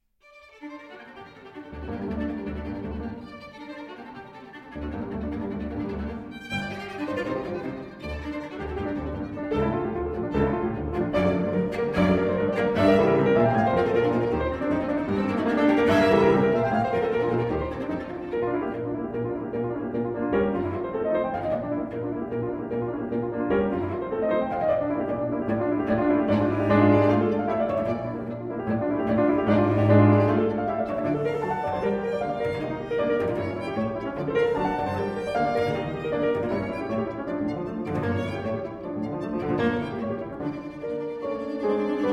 Trio in C Minor, Scherzo. Molto Allegro Quasi Presto; Op. 66 III.